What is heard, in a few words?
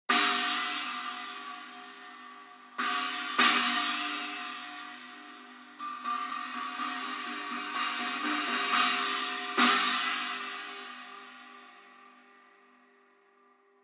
Custom China 18